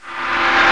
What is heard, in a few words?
Reverse tam tam; Hidden Gold Lite